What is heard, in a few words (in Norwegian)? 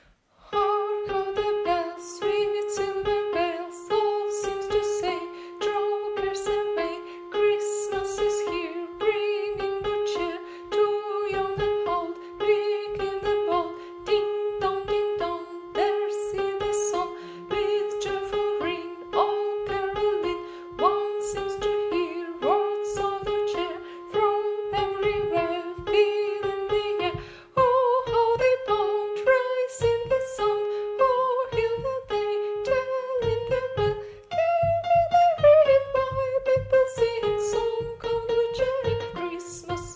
sopran; Carol of the Bells